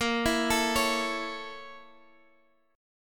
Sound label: A sharp Minor Major 7th Flat 5th, Guitar Half Step Down